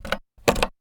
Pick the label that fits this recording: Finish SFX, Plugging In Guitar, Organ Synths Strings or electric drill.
Plugging In Guitar